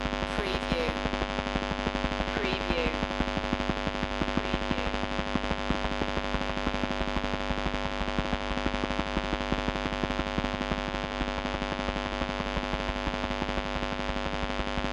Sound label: Electric Hum Static